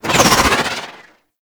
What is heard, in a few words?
Concrete Archgun Projectile By